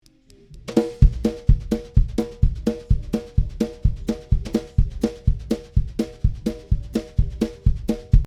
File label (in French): une base de grosse caisse et caisse claire (mics dessus) les deux ni égalidé ni compressé; batterie